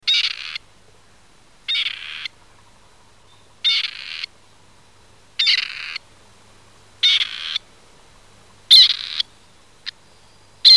Gray Partridge, songs

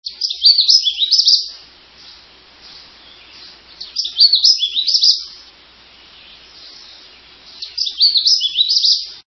Canada Warbler

